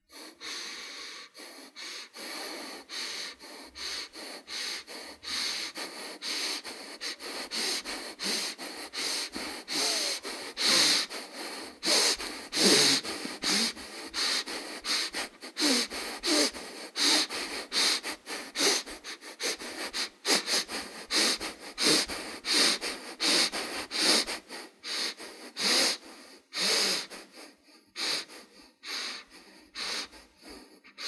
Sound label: Breathe through nose